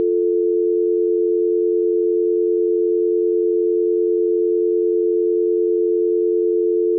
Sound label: dialtone; 3CXPhone